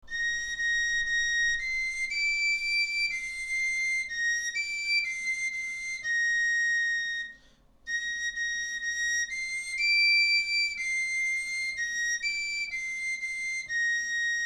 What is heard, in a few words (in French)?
Au Clair de la Lune, galoubet; Gal ut